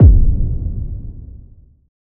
impact

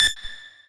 lead

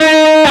Left-hand vibrato; osu

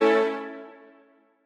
Strings

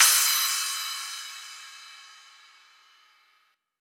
CRASH

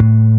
bass

